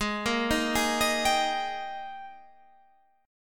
Ab Major 7th Suspended 2nd Ab Guitar Half Step Down